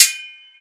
mech shumnij visokij v prostranstve

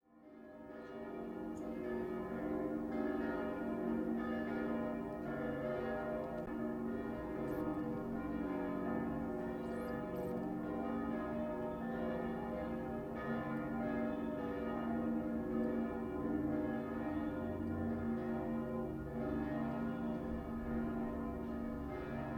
gorodskaya cerkov perezvon kolokolov vdaleke

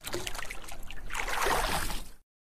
vsplesk ot vilitoj iz kruzhki vodi v rakovinu

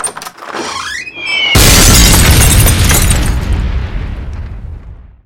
kto to otkrivaet dver a zatem srazu vzrivaetsya

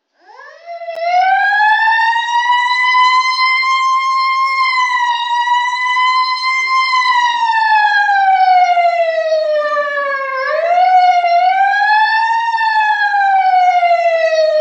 zvuk sireni policejskoj mashini

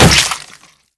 zvonkij korotkij zvuk upavshego vozdushnogo shara s vodoj na zemlyu

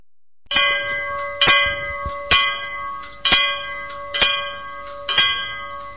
monotonno povtoryayushijsya metallicheskij zvon

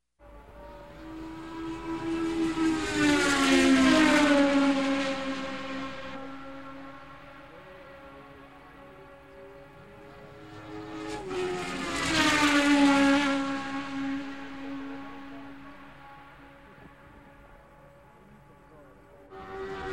zvuk gonok formula-1 proezd mimo 3 raza

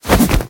vnezapnij krepkij boevoj zahvat

